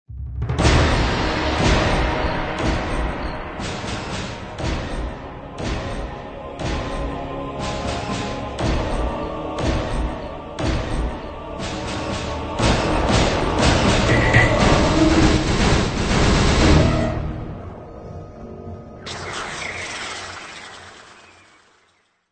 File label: Library Drone